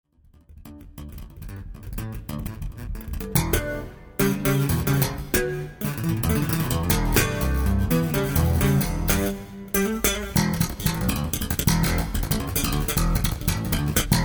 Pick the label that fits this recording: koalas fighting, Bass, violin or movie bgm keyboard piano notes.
Bass